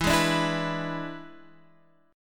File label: E 7th E down Guitar Standard; E7 strummed; JGuitar